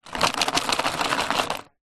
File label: household clothes pegs wooden in plastic tub shake